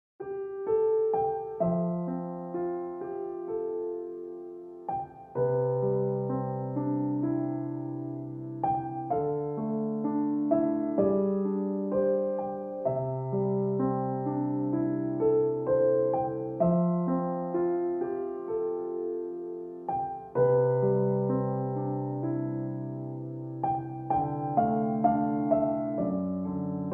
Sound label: Early Sunshine Ringtone Piano Music